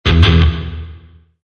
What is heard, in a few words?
rock